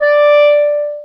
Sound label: Alto Clarinet Portato D4; VarazdinOrchestral